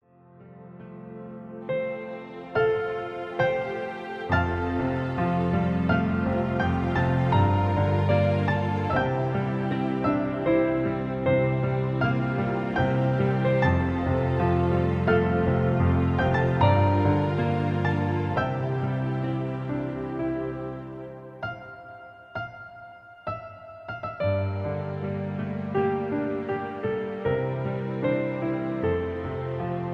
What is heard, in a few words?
Celtic Music